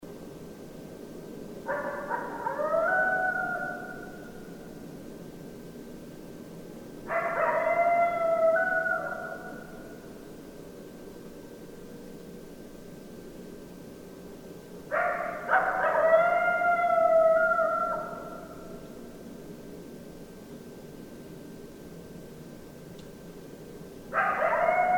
Coyotes; Coyotes (Canis latrans)

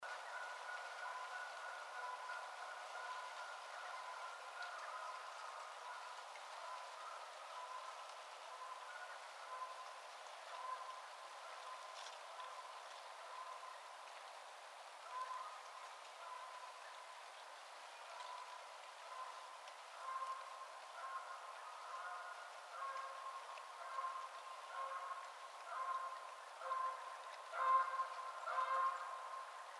Canada Goose

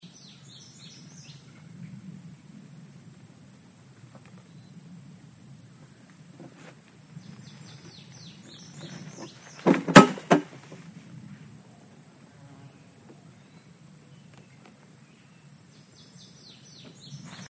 lid

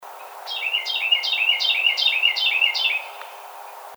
Carolina Wren

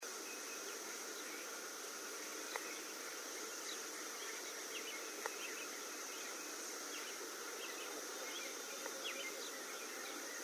Cattle

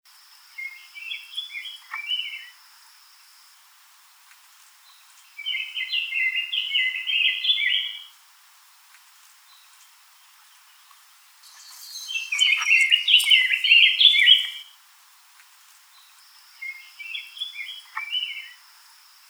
Rose-breasted Grosbeak

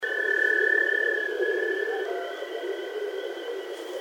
voice, bigfoot

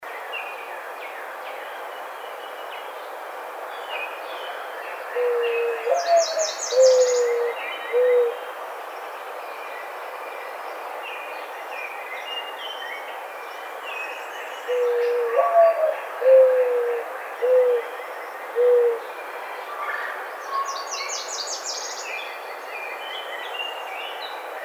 Mourning Dove, birds

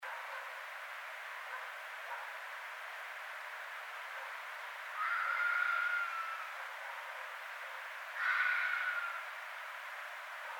Red Fox

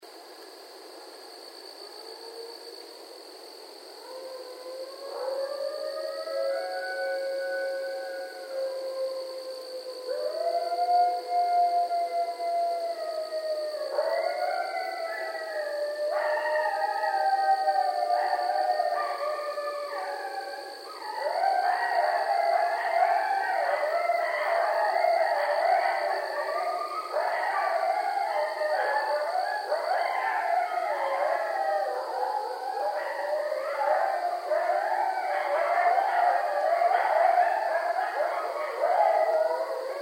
Coyotes; Coyotes (Canis latrans)

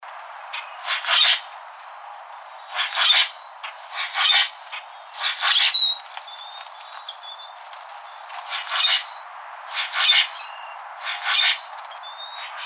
Common Grackle

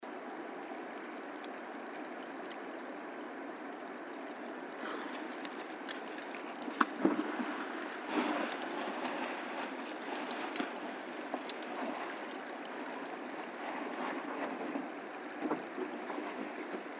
Water Splashing; bigfoot

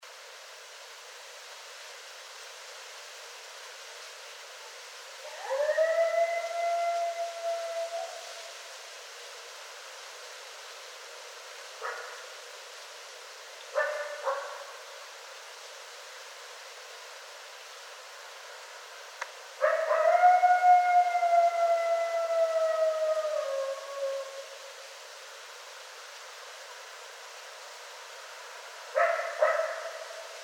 Coyotes; Coyotes (Canis latrans)

